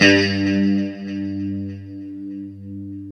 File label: LO E G 2, Guitars; Spectrasonics; Hans Zimmer Guitars VOL-1; JC-SHEEN